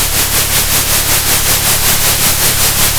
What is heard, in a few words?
pop, Sound of a train